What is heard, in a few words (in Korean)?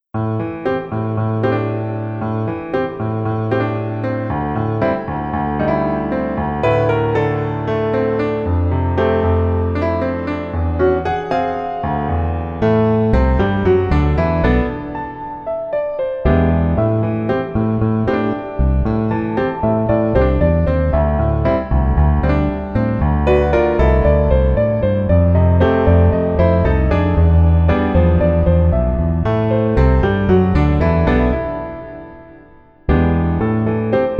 Piano ver 멜로디MR -1키